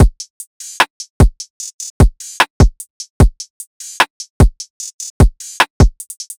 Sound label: Full Drum Loop - 150 BPM; Cymatics